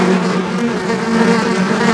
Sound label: flies